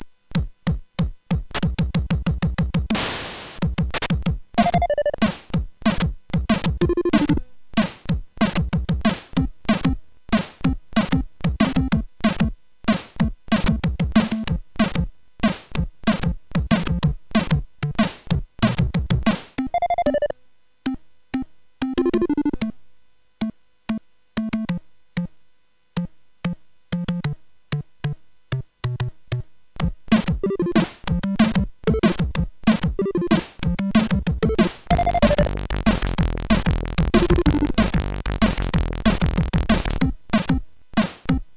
For Break Dance, CSG8580R5; hvsc

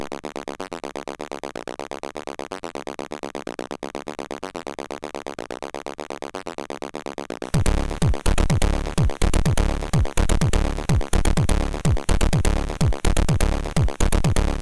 Small Techno, sid MOS6581R2

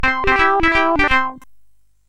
Clik Clak, CSG8580R5

